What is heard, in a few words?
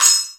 Perc Metal Crash Slap